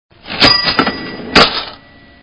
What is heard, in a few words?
cash register